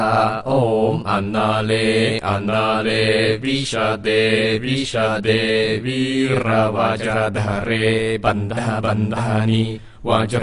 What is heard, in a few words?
Shurangama Heart Mantra